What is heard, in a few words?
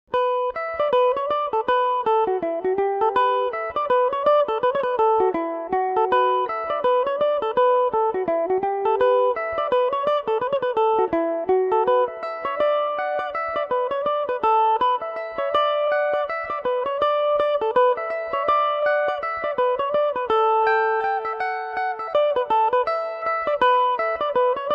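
E dorian, irish mandolin; john kellys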